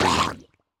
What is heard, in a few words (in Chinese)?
水中受伤声; 溺尸